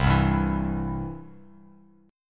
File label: C Major, Bass Standard; strummed; JGuitar